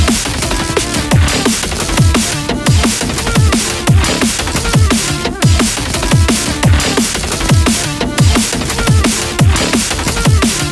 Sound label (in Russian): dnb remix rington